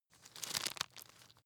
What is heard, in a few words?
nut creak; haus garten; kueche